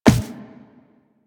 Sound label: Walk Default, Footsteps; Warframe; Operator